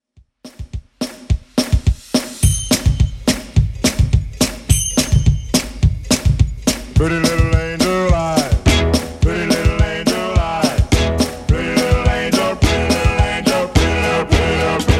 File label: 50s Redrum 106 bpm